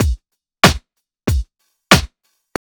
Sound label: TRIP 90, trip loop